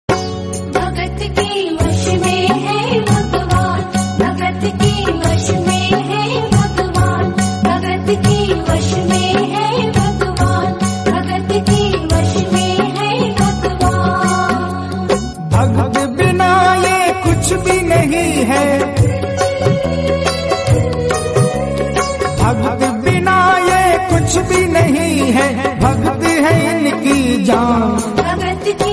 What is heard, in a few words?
Krishna Bhajan; Krishna Bhakti